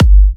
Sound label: G, kicks; markus hakala kicks